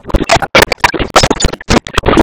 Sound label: Bullet Orb Explosion Large; Enemies; Abilities; Warframe; Zariman; Void Angel